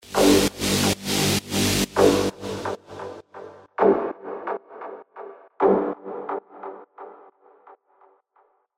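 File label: Synth Loop G major (132 BPM)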